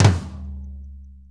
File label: 6-8 Tom Flam, Drum Loops